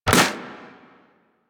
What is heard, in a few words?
Primary Shot, Weapons; Sapient